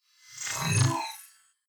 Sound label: flyby splutter